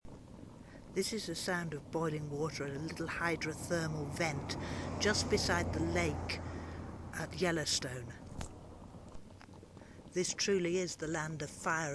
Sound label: water fire and us 4x4 drive bys